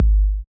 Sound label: Kick, Belgium Beats 132bpm; Big Fish Audio - Old Skool House; OSH Construction Kits